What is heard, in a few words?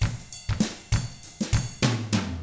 FUNKY, Drums